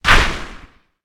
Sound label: dodge